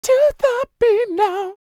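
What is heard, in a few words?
falsetto, R&B Dance; Spectrasonics Vocal Planet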